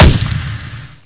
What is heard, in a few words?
mp5 shoot